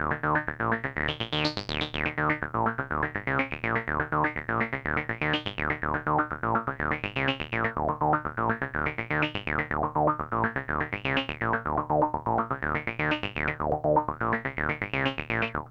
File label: RAVE 123, SYNTH SEQ; AMG; Global Trance Mission VOL-2